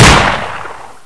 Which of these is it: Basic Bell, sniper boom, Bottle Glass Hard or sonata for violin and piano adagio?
sniper boom